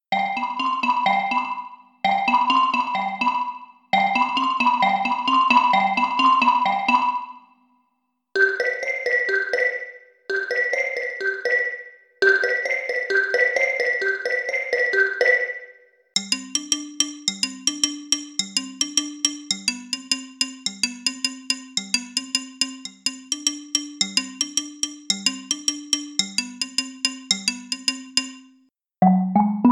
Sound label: modernist xylophone